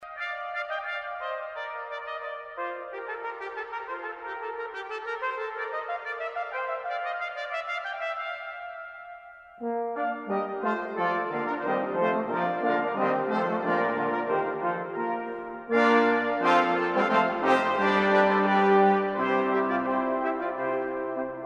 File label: Canzon